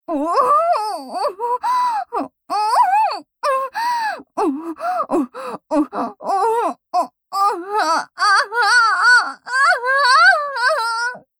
喘ぎオホ; BGV

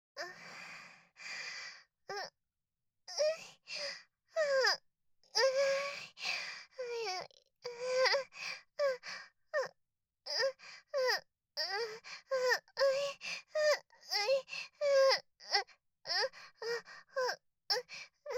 挿入 喘ぎ; SEなし

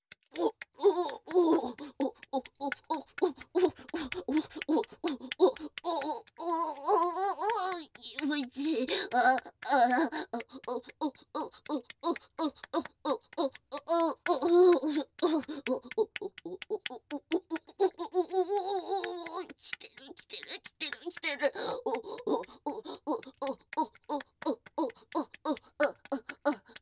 オホ声; SEあり

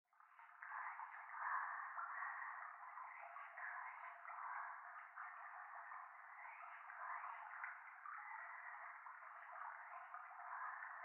教会 しこしこ 川のせせらぎ

